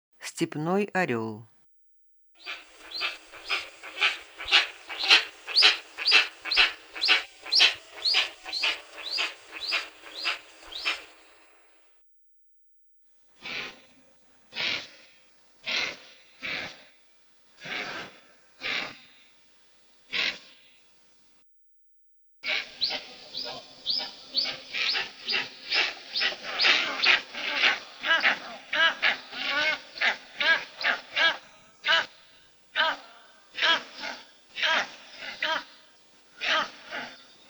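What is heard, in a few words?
steppe eagle voice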